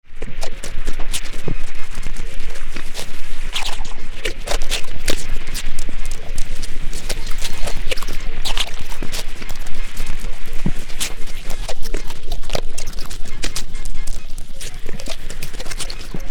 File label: munching monsters spooky sounds